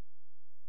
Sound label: C#, Synclav FM, FX; Big Fish Audio